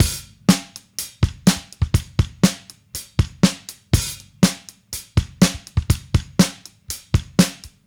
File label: Drums, 70s Analog Funk; Sonic Foundry (Sony Creative Software); What it Is!; Super Brown